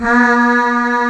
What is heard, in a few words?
VOICES E3, Analog Fantasies; USB Soundscan vol.49; AKAI; YAMAHA